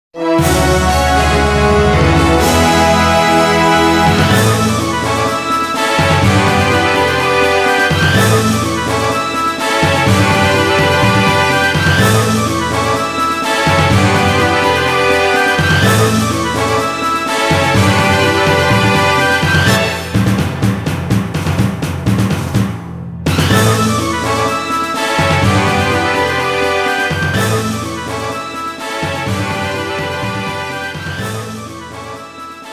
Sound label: Victory!; Rhapsodia